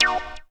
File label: JAM SYNTH, KEYS